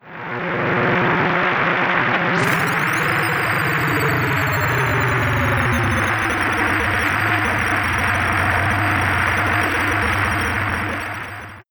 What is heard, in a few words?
buzz pulse 7mhz; hpsModelTransformation